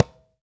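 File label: Conga Hi Mute; Roland BK-5; Percussion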